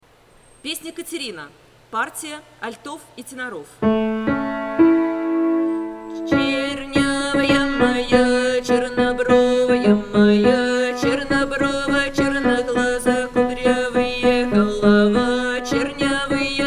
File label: alto i tenor